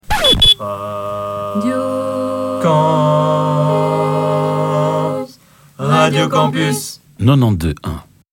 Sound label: a capella session